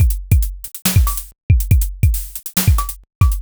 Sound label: trap drum loop 140bpm